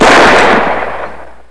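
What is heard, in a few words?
revolver; gunslinger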